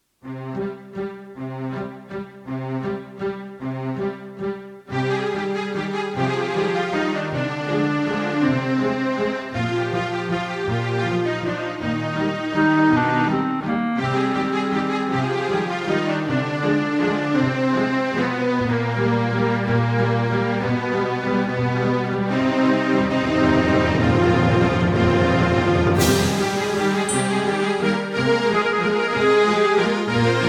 Valzer in Mi Bemolle Maggiore

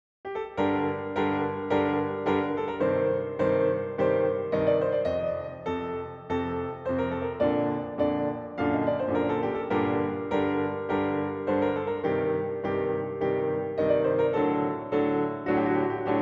minuetto

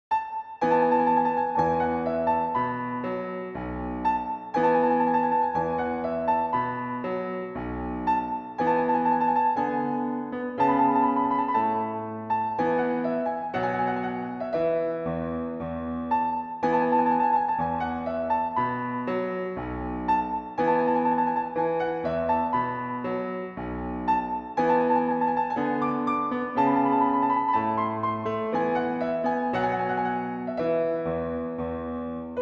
minuetto

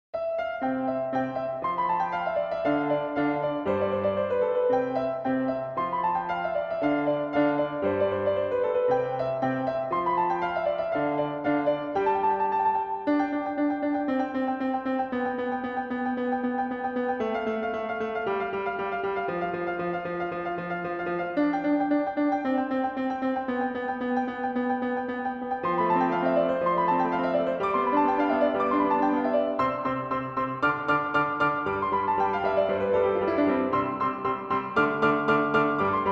minuetto

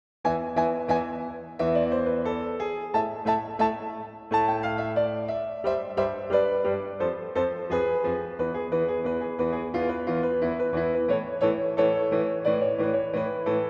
minuetto

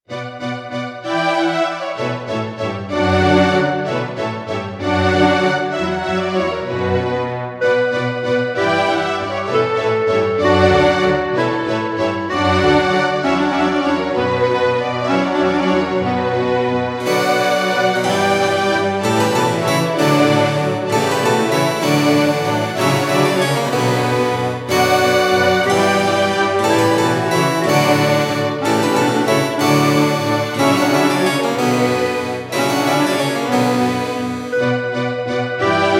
sinfonia minuetto; Op.152 n.11; III.

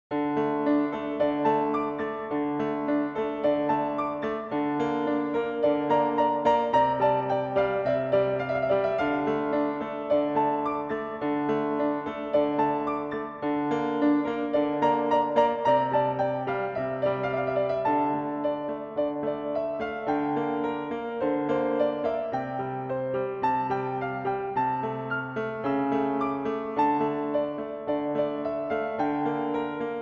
minuetto

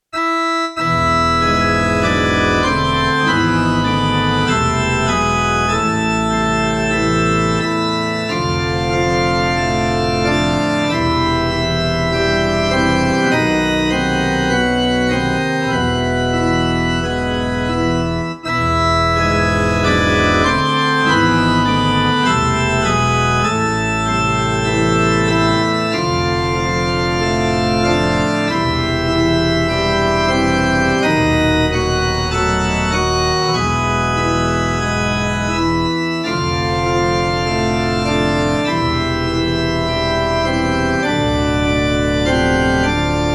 sonata per organo

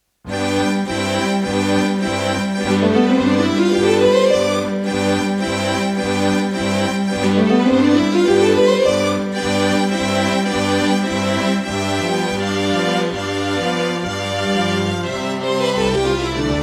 Minuetto in Do Maggiore

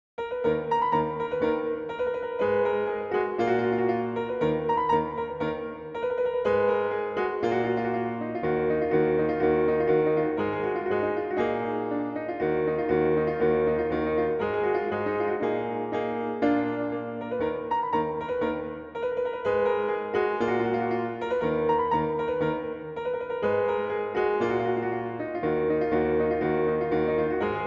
minuetto

